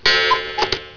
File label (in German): kuckuck